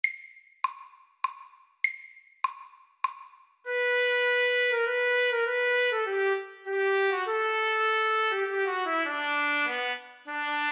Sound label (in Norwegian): Lyrisk etyde i A dur FORT